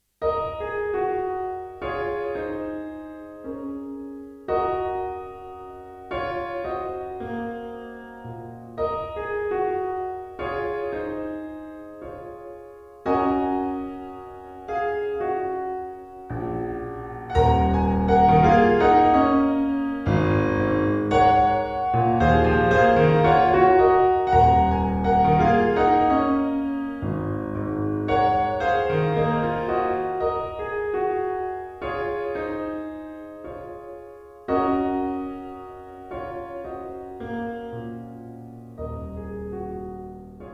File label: piano pop